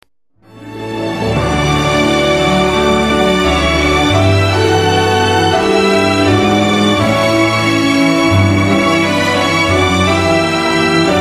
Ukrainian art song; Ivasyuk; serenade

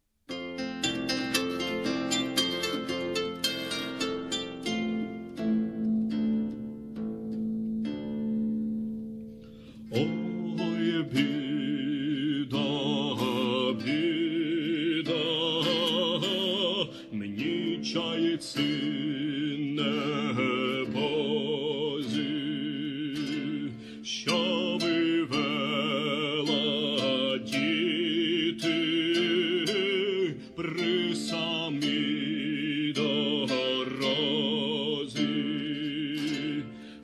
Ukrainian balada baroque song

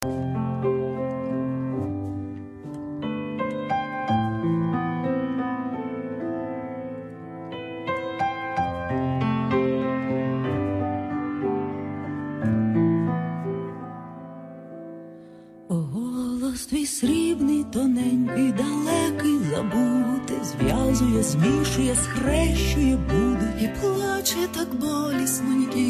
song by Ukrainian music group

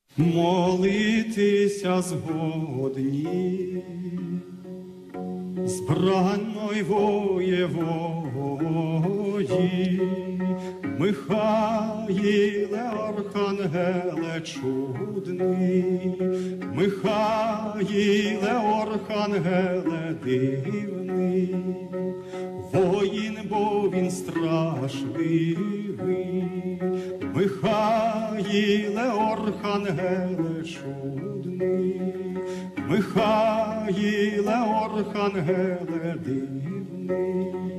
Ukrainian baroque military song

